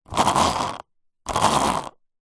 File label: sport skateboard wheel spin air by hand; zapsplat